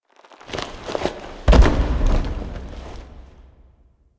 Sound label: Foley Drop; Cinematics; Stalker; Operator; Warframe; Orbiter Cradle